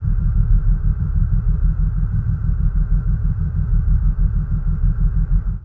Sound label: Starbase Fortify Idle Amb; Sid Meier's Civilization 4 Complete; Beyond the Sword; Final Frontier; Units